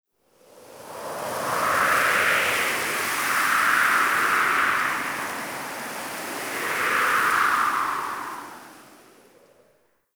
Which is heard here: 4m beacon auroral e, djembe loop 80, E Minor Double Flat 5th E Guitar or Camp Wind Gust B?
Camp Wind Gust B